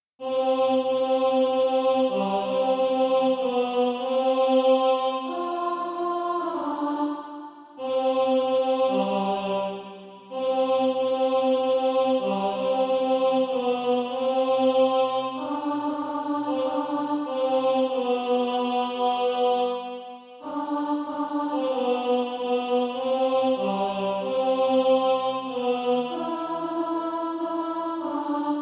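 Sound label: tenor, Practise Music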